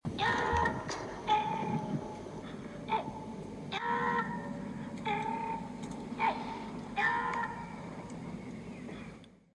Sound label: bird sound